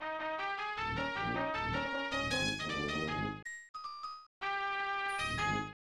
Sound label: Game Clear; The Adventures of Rocky and Bullwinkle and Friends; SNES